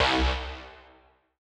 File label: Darkstar Lead C1; Grime; 135bpm; Zero-G Sound Sense Grime Scene; Construction Kits; A Positive Vibe